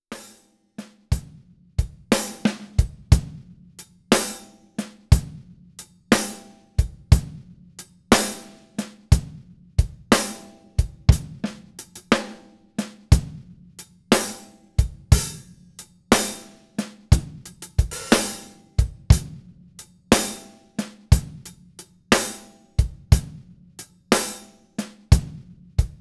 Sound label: shuffle